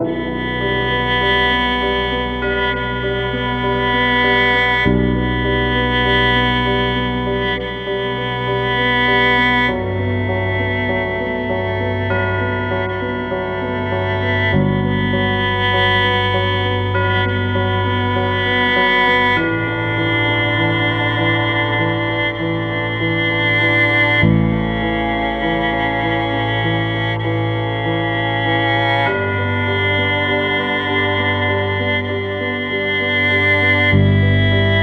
Tension Loop 99bpm; project zomboid

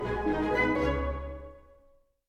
Neutral End 60bpm; Music Dialogue

